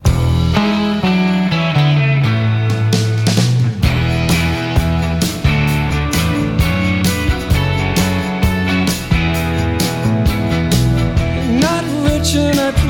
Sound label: drummer